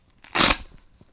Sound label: Velcro